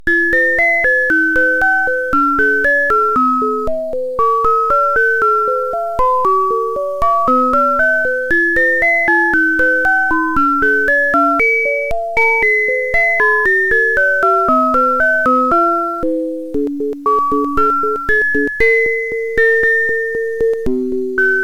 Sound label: believe sine